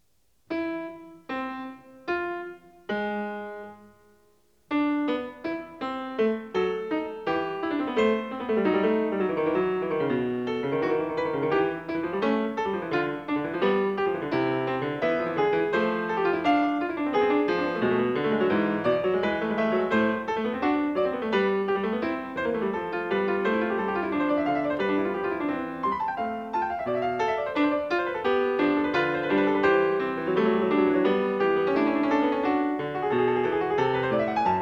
Fugue No. 20 in A minor, Bach, Well-Tempered Clavier; BWV889